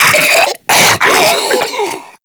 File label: Infested Diseased Ancient Charge; Warframe